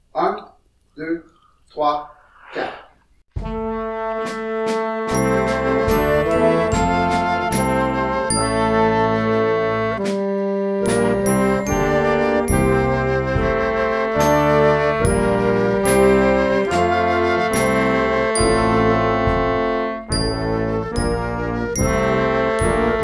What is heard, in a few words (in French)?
pont des clarinettes noire a 76